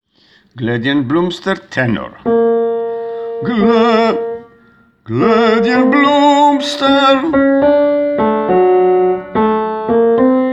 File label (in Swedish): tenor